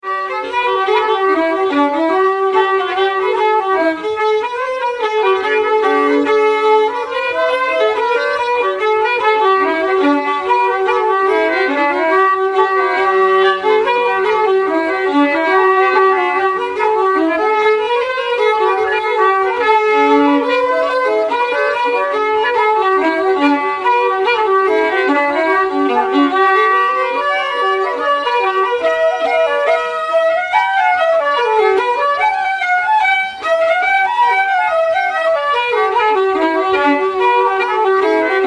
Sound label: Bantry Bay Slow G